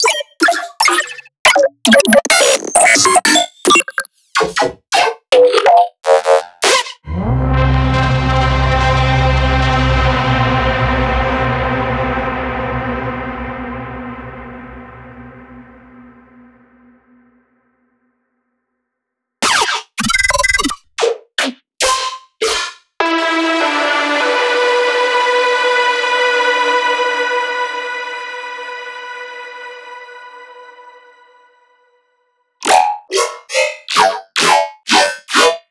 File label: Synth Shots